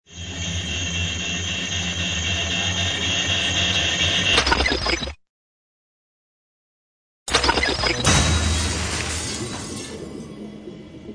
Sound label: sound interupt